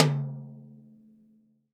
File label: TD-20 Tom A, Roland TD-20 Toms